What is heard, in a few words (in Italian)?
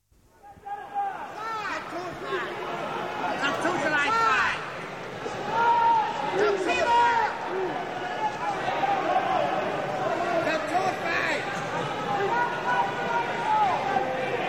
FOLLA AL MERCATO CHIUSO